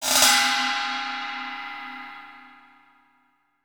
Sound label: ride